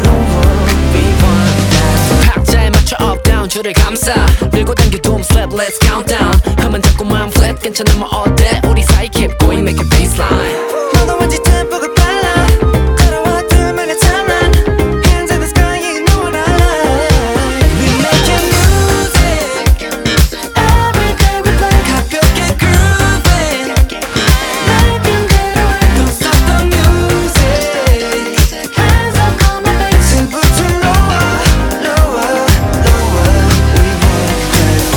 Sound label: Boom Boom Bass